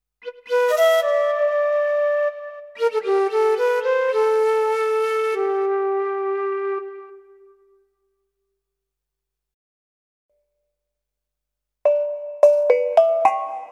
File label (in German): Roland EA7 Ethnic Instrumente